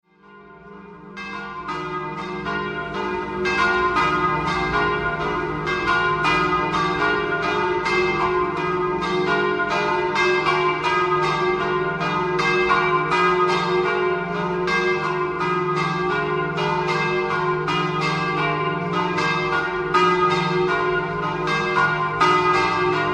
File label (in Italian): BASAGLIAPENTA, campane